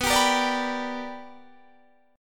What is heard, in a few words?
B Minor Major 7th Sharp 5th Guitar Standard; strummed; JGuitar